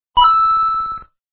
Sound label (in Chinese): 铛啷声; 疯狂金币